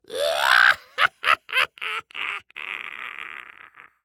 Horror Scary Human Male Laugh Cackle Laugh

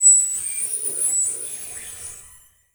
Title Transition Digital Readout; Production Element Title Transition

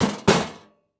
Sports Grill Metal Setting On Cement

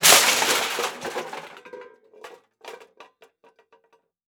Crash Metal Objects Falling On Concrete Short; crashes; AdobeCC

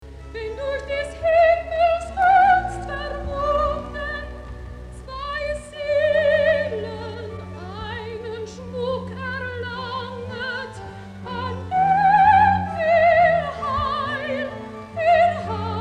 Recitativo

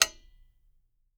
Household Lamp Switch

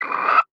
Animal Primate Monkey Capuchin Growl; animals; AdobeCC

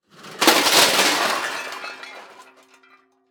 Impact Metal Objects Dumped On Cement; Impacts

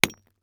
Weapon Pick Axe Hits Rock; AdobeCC

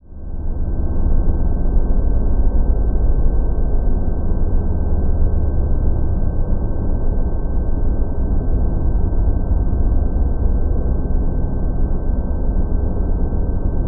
Drone Science Fiction Power Tone; Production Element Imaging; AdobeCC

